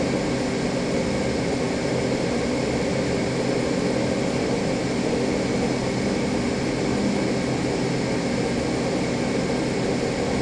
Aircrafts Engines Mig29; DCS

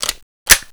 Inf Weapons Foley 3rd; Red Orchestra 41-45

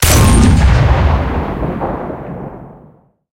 cannon 57mm shot, cannons tanks; zis4

